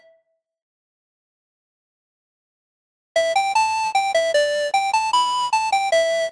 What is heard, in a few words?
stem lead, music loop; arcade